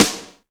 MIX SD, DRUM RYTHM; AKAI S6000 CD-ROM - Volume 1

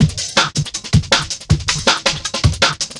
Classic Beat, Drum N Bass; Sonic Foundry (Sony Creative Software); Knutrix Drum & Bass

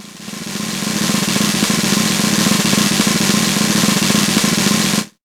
ROLL SD 2, snare; KICK + SNARE; Best Service; Hyper Dance

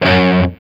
G 3, Soldano rock guitar; Spectrasonics; Hans Zimmer Guitars VOL-1

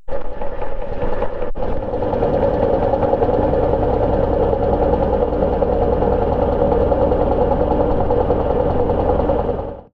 Washing Machine, contact mic, mechanical; musicradar; contact mic madness; Raw

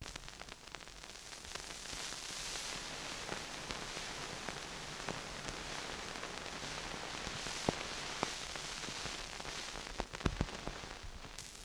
Vinyl Old 78, Noise; layering

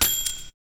XTRA PERC

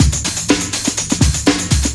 Pin Up Break, breaks

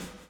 BLOW; Spectrasonics - Backbeat

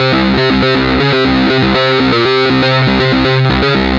Mass Bass, Bass Foundations; Sonic Foundry (Sony Creative Software); The Electronic Music Manuscript Collection

